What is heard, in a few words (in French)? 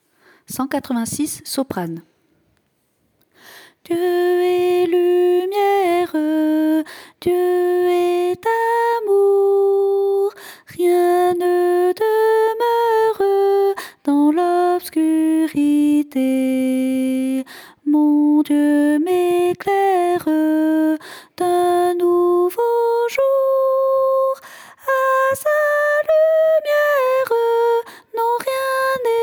soprano